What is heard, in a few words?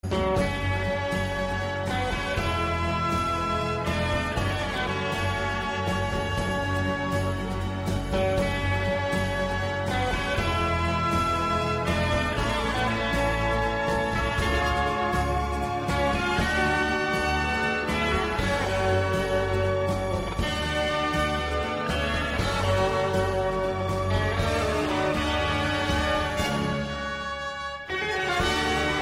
Mexican tune, ringtones